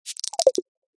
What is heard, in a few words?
roll